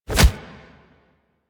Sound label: Charge Body Impact; Enemies; Purgatory Warrior; Warframe